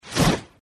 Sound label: popup out